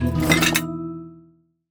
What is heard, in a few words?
Notification Alert Positive Successful Cook Minigame Chime Tone Dishes; Sunflower Land